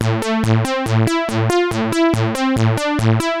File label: perc bass